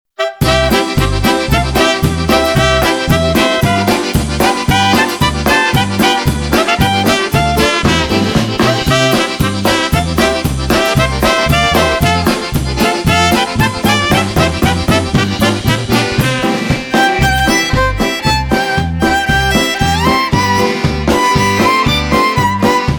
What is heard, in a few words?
Polka